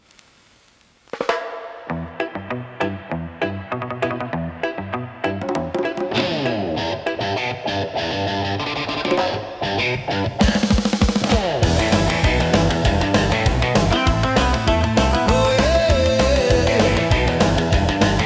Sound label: Reggae